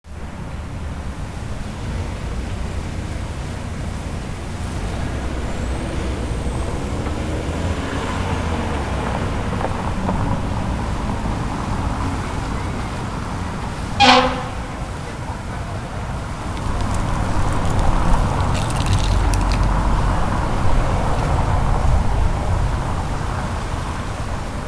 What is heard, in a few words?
city street